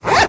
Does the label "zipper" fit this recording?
Yes